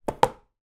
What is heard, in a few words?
household vhs video cassette case empty down on table